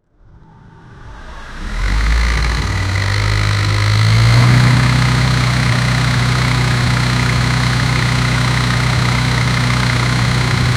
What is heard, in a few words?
v type 2 cylinder ohv, engine